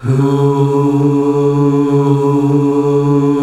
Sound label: HUUUH D, male choir; Best Service; Hyper Dance